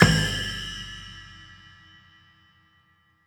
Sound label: Snare, Splash, Kick; One Shots; Essential Percussion